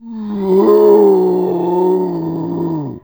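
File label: zombie; sinfar haks; sf effects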